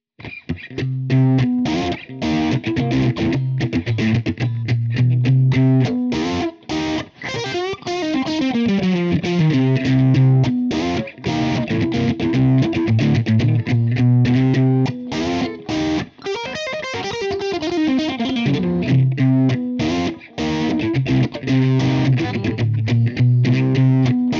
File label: crunch funky; jcm